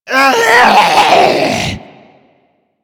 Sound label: Zombie Attack, Vocals; Mariner Zombie